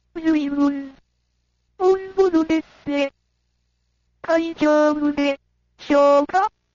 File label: Ride Spaceship; PC-6001; おんぼろ宇宙艇; コロニーオデッセイ